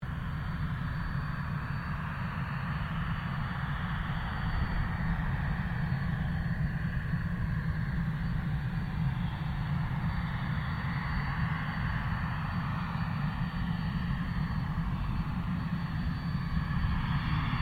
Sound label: AIRCRAFT CESSNA A-37B DRAGONFLY TAXI SHUTDOWN, Jet Fighter /Bomber; Airplanes; Sound Effects:Vehicles